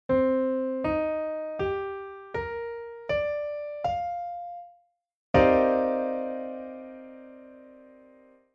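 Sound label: chord piano m7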